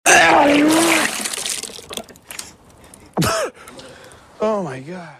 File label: puke